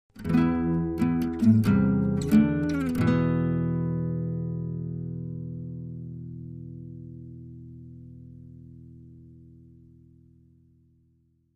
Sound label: Acoustic Guitar Romanti